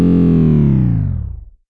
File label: generator out, ambience